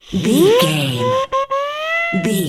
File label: comedy siren whistle fun sounds